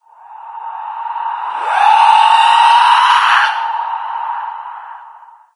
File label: chatter, lostsoul; doom3